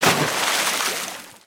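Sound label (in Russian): спокойный всплеск от удара об камни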